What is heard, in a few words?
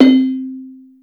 stone soft, Yamaha Motif ES drum machine; 300 Drum Machines; Yamaha Motif ES-Series